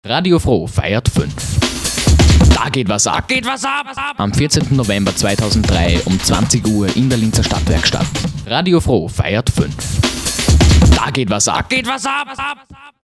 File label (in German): jingle kuerzest; fro feiert 5